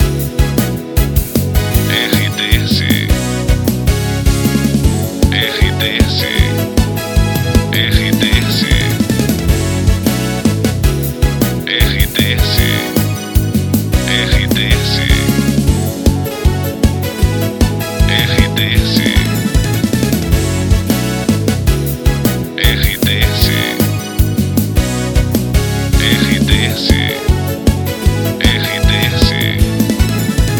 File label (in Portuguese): TRILHA PARA LOCUCAO WEB NET POPULAR